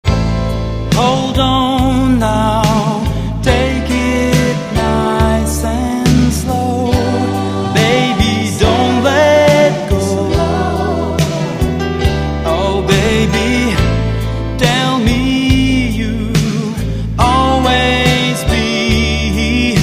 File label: Nice Slow